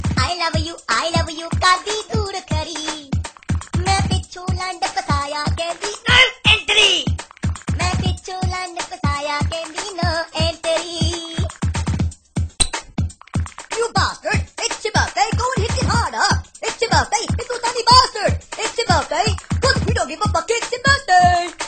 funny song punjabi

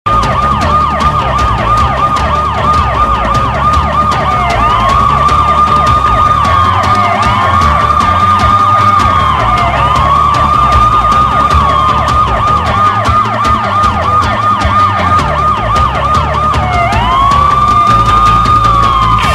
cop car siren

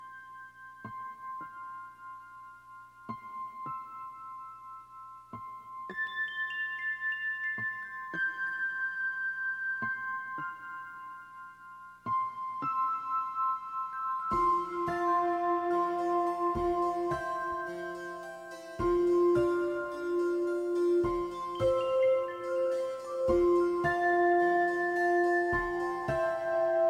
christmas lullaby

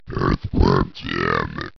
Burp

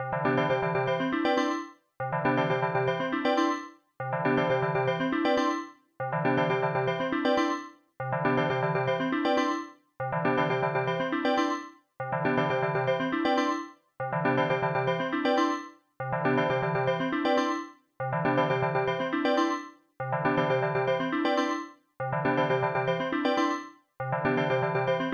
Bell Chime Entry

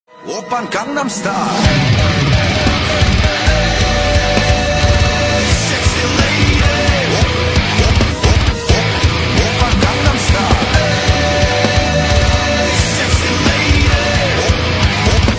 metal remix

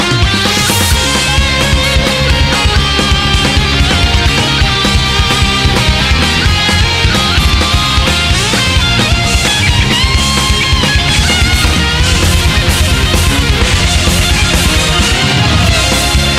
Mech Rhythm; Xenoblade

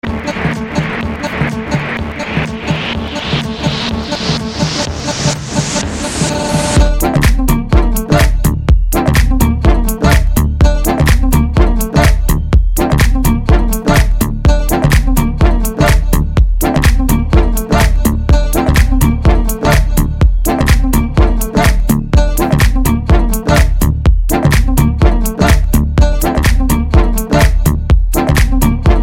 bg minimal

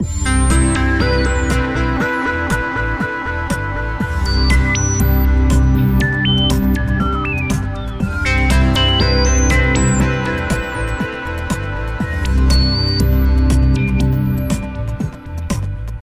Ambient Tone

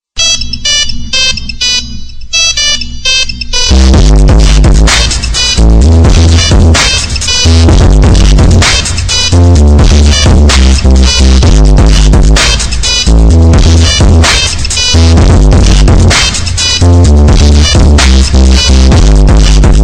Nokia High Analog

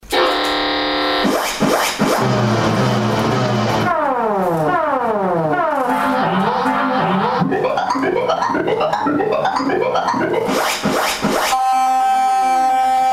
alarm mix

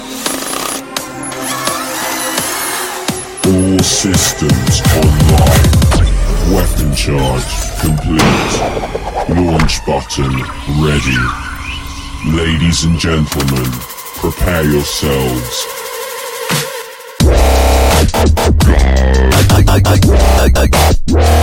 trance dubstep

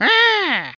Grunty

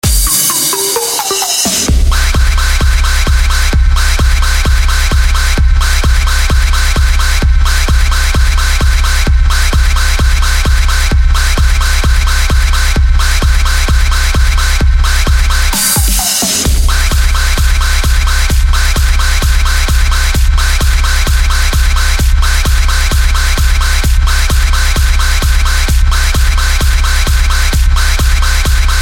Big Room House

